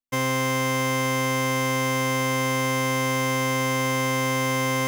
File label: Test Tone; Test Tones